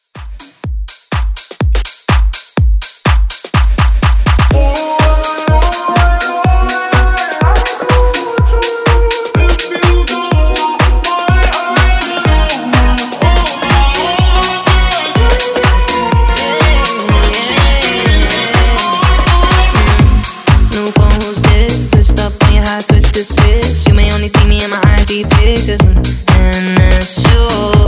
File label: Pop Dance; Redrum